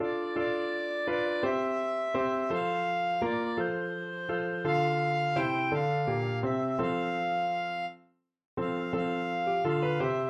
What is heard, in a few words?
puer natus, clarinet